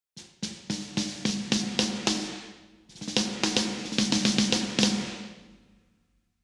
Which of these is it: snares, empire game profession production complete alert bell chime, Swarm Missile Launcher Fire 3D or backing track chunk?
snares